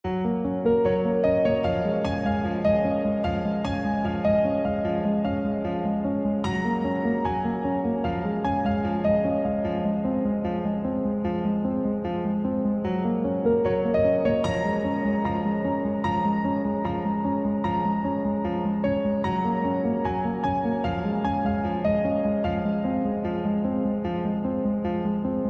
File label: piano